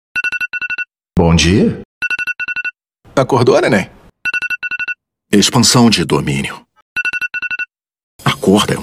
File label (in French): alarme; gojo